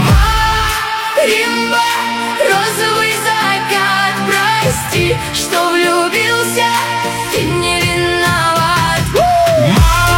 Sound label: Marimba